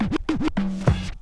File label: SCRATCHES